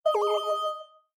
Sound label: Exclamation